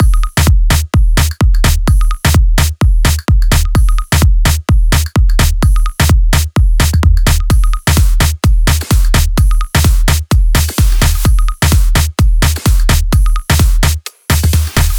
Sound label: Dirty Dutch Kit Beat; Mutekki Media; Vengeance Electroshock Vol.2; Dirty Dutch Kit 1